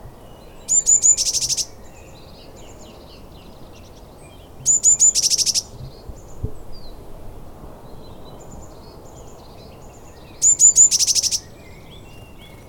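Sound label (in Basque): amilotxa, Herrerillo común, Cyanistes caeruleus, Canto; Observatorio de aves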